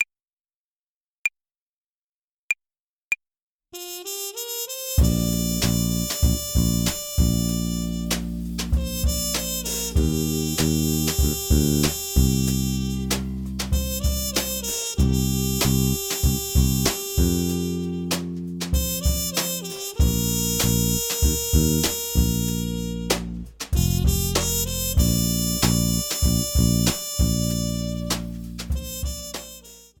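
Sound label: Play-along, Jazz Club Klavier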